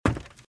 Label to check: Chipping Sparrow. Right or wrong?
Wrong